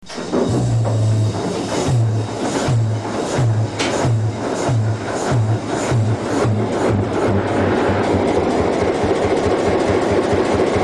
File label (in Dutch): Starten; HLD; Reeks 62 63